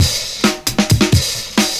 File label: FILLS, 70_s Breakbeats